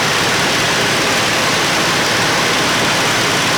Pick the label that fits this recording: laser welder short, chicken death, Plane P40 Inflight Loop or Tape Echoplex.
Plane P40 Inflight Loop